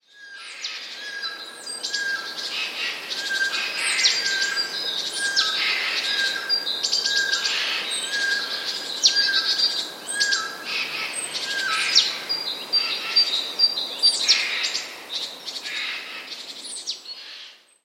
sojki; dalsza sójka krzyczy typowym sójczym głosem, bliższa dźwięcznie pokrzykuje

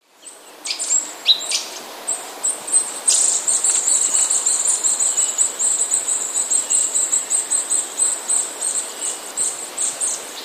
kowalik wysoki tryl; wysoki wibrujący głos kowalika

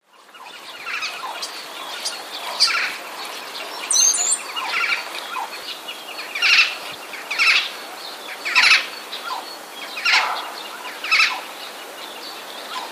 sojka jak sroka; sójka niezbyt udolnie naśladuje srokę

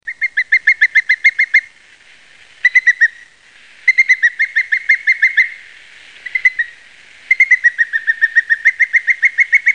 orzełek, głosy

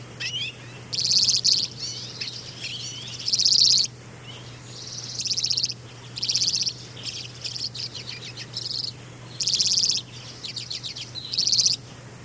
czeczotki

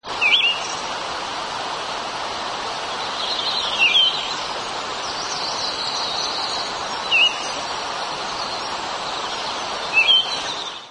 drozd ciemny; Geokichla sibirica; wroblowe; turdidae; żyje na Syberii

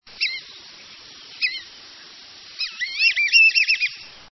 jesionka; drzewice

